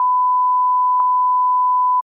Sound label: Surround Test 1khz Tone